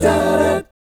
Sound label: F13, GUY CHORDS, Vocal, Jazz; ILIO; Vocal Planet VOL-3; Jazz & FX